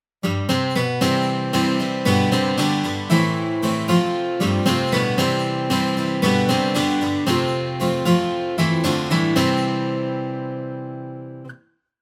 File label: guitar